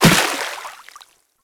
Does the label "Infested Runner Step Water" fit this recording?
Yes